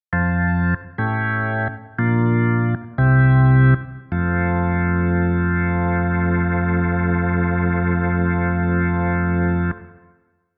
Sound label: Model C